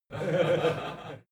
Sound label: laugh male short